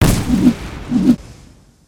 Charge Fire Harpoon

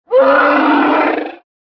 dragon roar

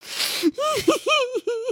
cry

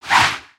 Light Whoosh; Valiant; aronwk collection; ndaudio

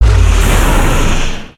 Dark Thunder; Sorcerer

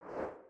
Slow Flap; creatures organic

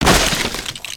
Crates n Boxes, destructibles

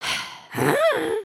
Shrug; emotes

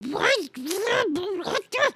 frustrated; emotes

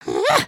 generic build

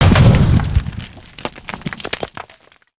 Pillar Impact Short

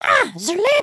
sway, emotes

